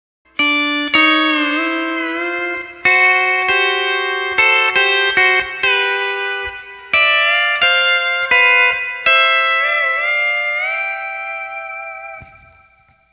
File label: D13 Stock E9th Lick; D13 Copedant Tabs